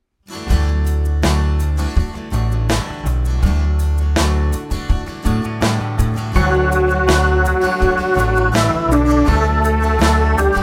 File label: melodic dictation